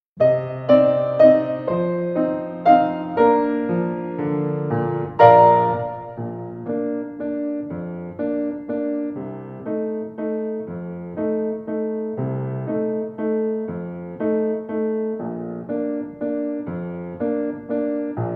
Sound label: waltz accomp only